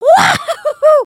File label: excited, emotes